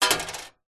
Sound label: foley money coin drop into empty metal collection drawer